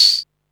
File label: PERC, Techno 138 BPM; Best Service ProSamples vol.54; AKAI